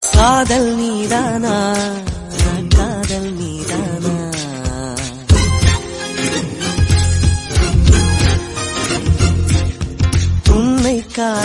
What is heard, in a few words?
Tamil Song; ringtones